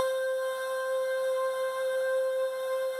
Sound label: UI, Melody C High Body 1 Octave